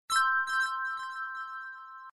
sfx slow

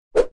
swish

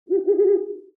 owl slow

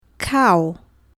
cow

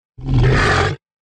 stegosaurus sfx

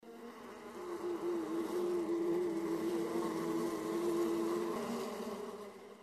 bees buzzing sound effect short

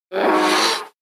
pachycephalosaurus sfx

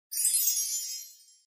glitter

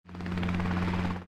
helicopter idles on ground

